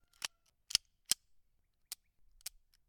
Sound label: 45 Load And Misfire